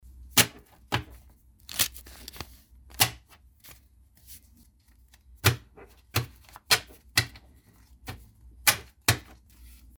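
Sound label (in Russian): thrown on a table, dollars; Звук купюр, долларов, отдельно и в пачках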